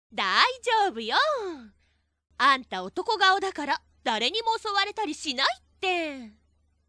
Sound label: haha